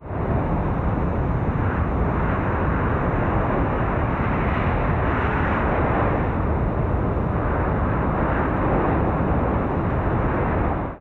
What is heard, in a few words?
ambient battle; World Of Warships